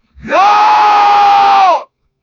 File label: an angry warrior scream